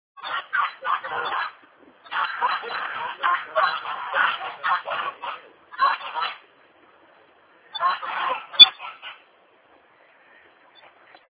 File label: Geese; Split; croatia